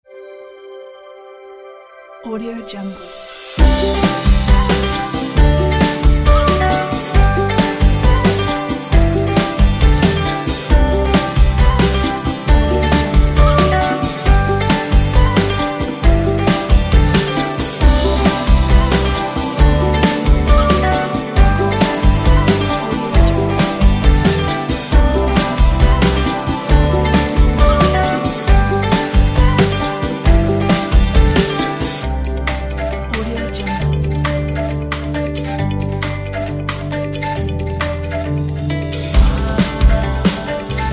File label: adventure island folk